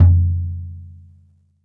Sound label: bop kick - snares off; JazzFunkKit